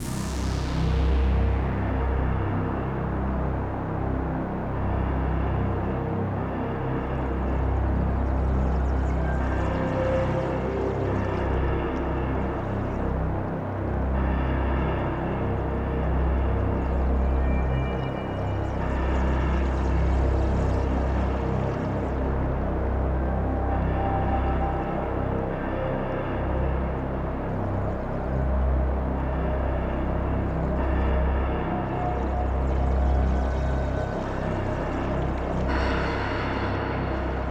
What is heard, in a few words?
Jingle Before the Boss Battle; r-type final 2 homage stage soundtrack vol. 2 2022